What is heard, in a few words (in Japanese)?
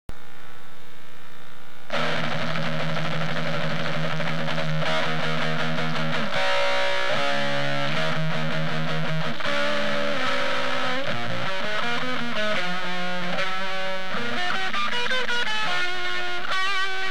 jisaku guitar amp